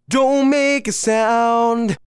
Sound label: manual Verse Distressed